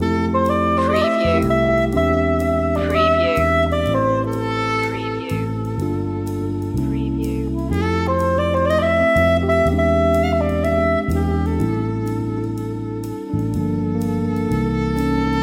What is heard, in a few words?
loop